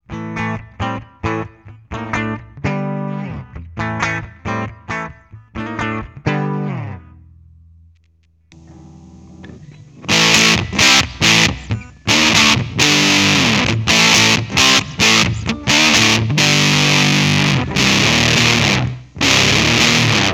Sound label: vox tone bender, Vox Tone Bender Germanium Fuzz; The Vox Tone Bender is a 60’s Fuzz guitar effect